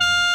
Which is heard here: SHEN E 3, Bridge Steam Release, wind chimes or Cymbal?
SHEN E 3